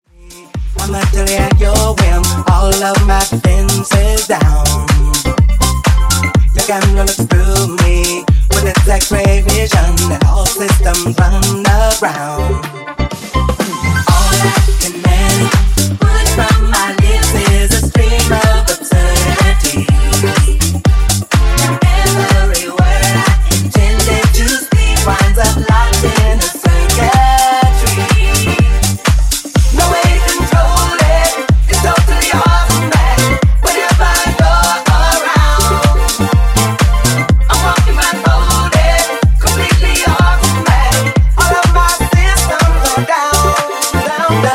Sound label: Disko Funk Remix 124Bpm